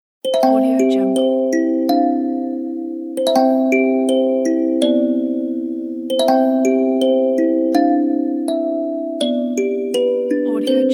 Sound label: magic kalimba ident; kolokol